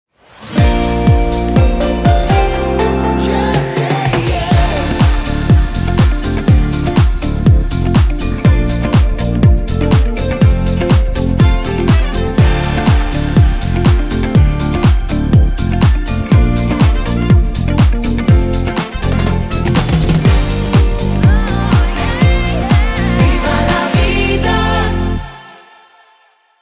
Event Theme; Das Inselradio Mallorca

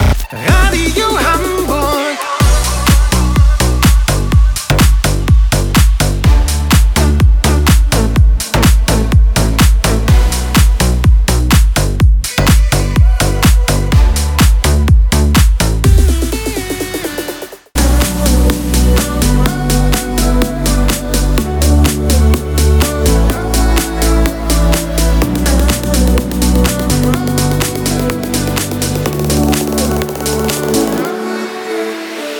Radio Hamburg Theme Fast

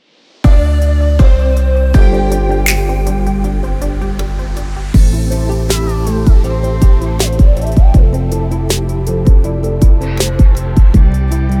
Slow Theme; Hamburg 2

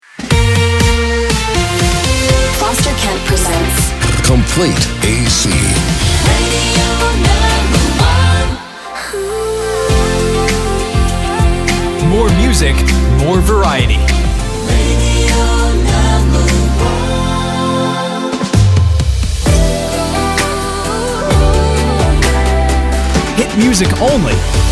Complete AC; jinglepacks

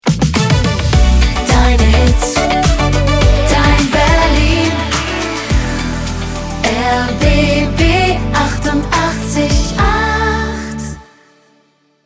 Transition Down

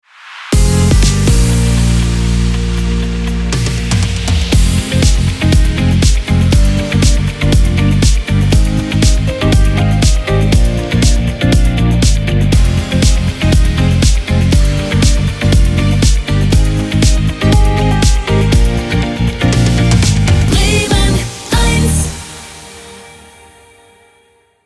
Daytime Opener; Bremen Eins

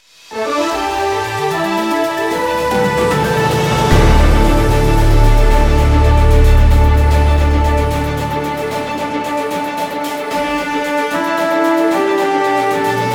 Breaking News

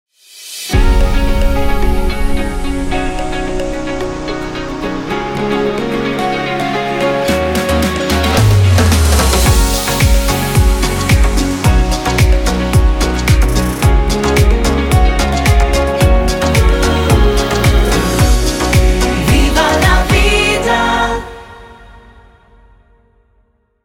Theme Relaxed; Das Inselradio Mallorca

